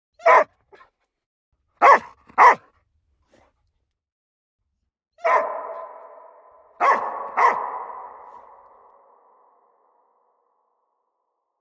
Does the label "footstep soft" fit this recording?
No